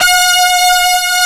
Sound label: brass, break beats